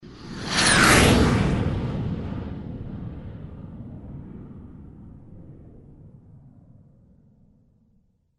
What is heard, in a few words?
dj drop jet flyby